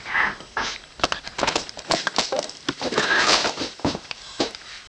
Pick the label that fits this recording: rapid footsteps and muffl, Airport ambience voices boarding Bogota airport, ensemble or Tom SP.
rapid footsteps and muffl